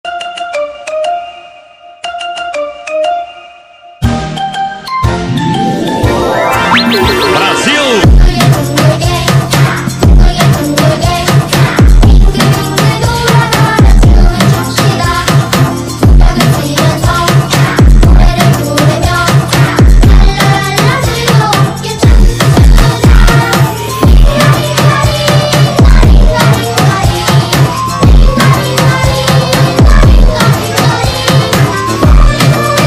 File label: funk